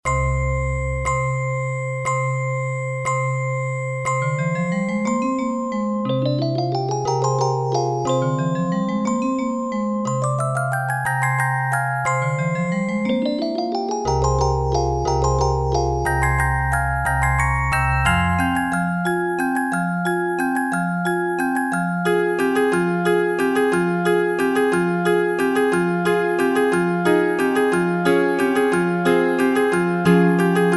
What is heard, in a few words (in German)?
Lied der Glocken